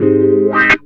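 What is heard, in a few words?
guitar chord wah funk; Univers Sons; Jazzistic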